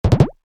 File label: Bar chart pop in animation infographics